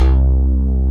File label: LINEAR SYNTH